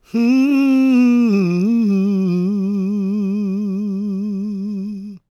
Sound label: GOSP MALE, SOLO; Spectrasonics; Vocal Planet; Gospel